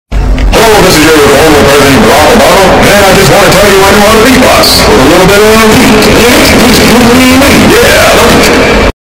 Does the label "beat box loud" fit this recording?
Yes